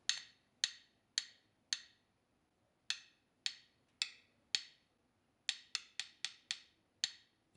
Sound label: Drum Sticks